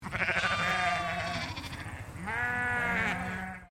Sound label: Animal Sheep Dorper Group Baa